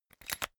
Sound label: umbrella telescopic retract fast